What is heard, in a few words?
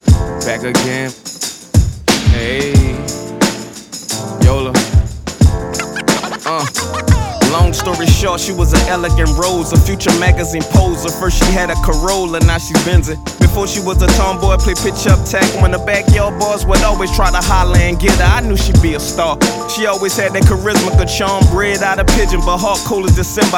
hip hop after